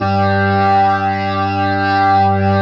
G3, OCT DIST ORG; Sonic Reality; Vintage Timetraveler

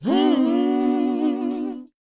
WHOA A, Vocal, R&B & Dance; ILIO; Vocal Planet

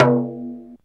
727 LO TIMB, TR-727; Hollywood Edge; The Big Bad Beatmachine

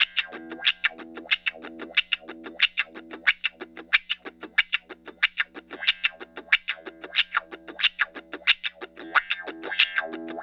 Call And Response Guitar, 70s Analog Funk

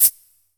Shaker Hit; Zero-G Jungle Warfare 1, 2 & 3; 90 s Sample CDs

